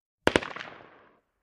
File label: M16 Short Barrel Mach